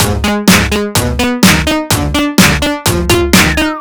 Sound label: Full, 126bpm, Nu Dance Electro; Zero-G; Soundclash; Combi Loops; Die Laughing